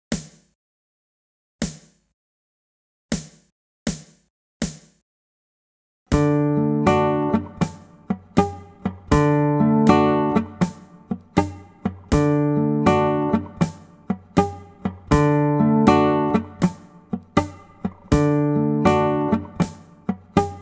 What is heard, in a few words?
ghost notes links langsam